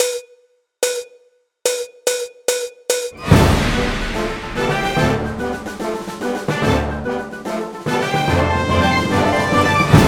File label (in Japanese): bansou